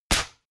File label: stylized fist punch